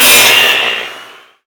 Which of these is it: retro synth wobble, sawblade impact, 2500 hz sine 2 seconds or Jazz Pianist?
sawblade impact